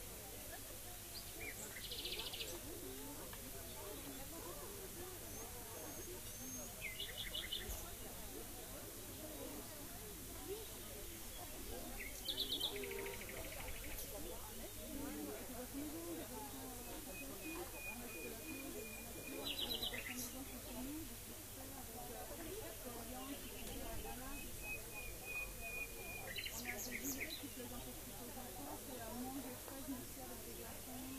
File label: rayol farm; SEAMED